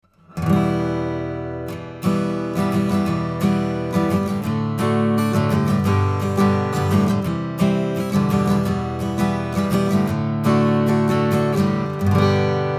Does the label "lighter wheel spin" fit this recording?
No